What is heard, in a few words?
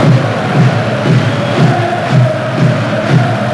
Chairs, Crowd; David Beckham Soccer; XBOX